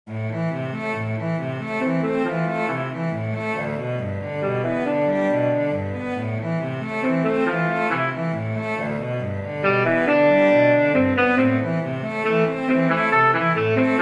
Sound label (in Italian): Duo Per Sassofono E Violoncello, MIDI